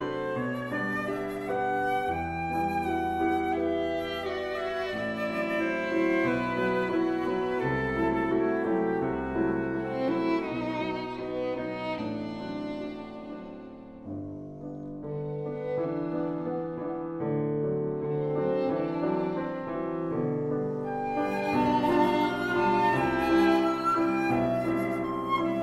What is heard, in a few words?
suite op 59 for flute violin and piano; i srnade